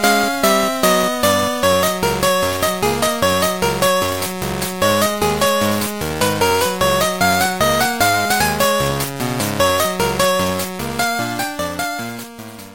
8 bit; tom jerry

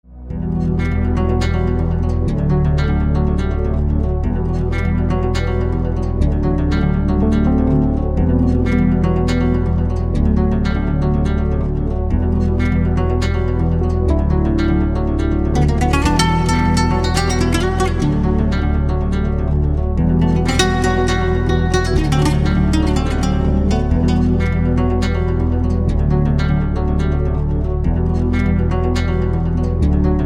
la guitarra

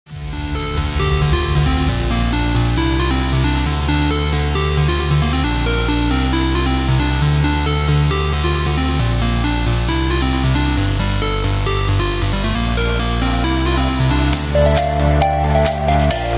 club mix

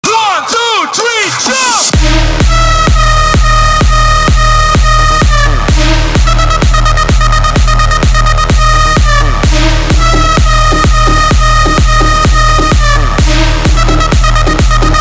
trap mix

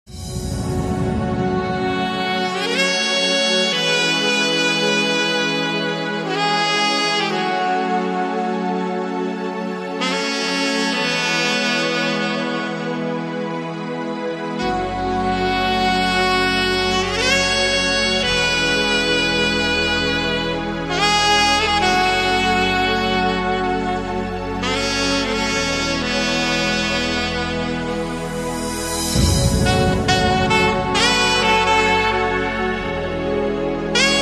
the horn

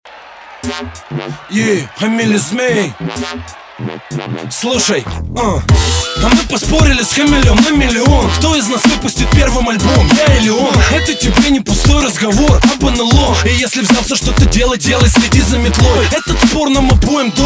dubstep remix

